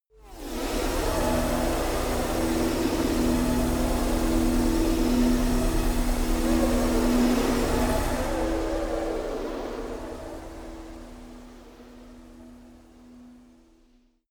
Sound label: space unknown lively world; atmosphaeren umwelt; weltraum